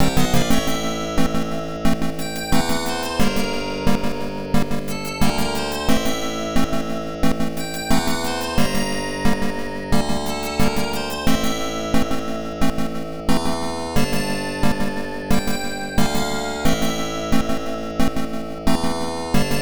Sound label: RPG - Game Over